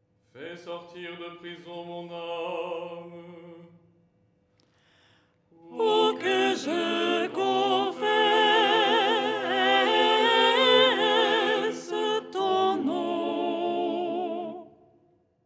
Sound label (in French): soprano